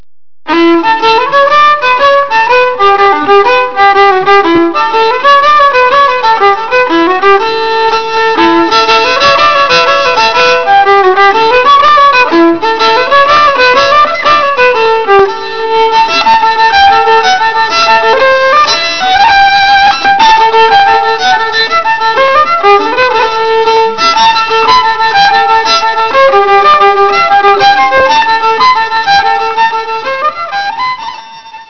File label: violin